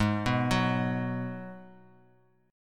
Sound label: G sharp Minor 7th; arpeggiated; x,11,9,11